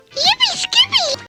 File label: yippie skippe